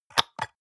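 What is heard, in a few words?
soap dispenser press almost empty short, bathroom; haus-garten; badezimmer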